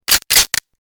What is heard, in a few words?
Pistol Sound